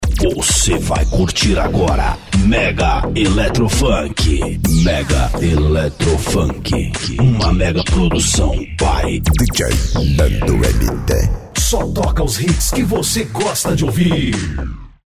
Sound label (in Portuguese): mega electro funk